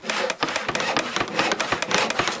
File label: Building; Beached II